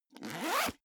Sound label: zipper backpack open fast